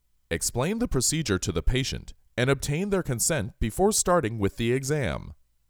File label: Percussion; Ab Percussion